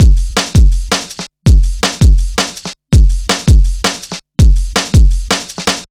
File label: break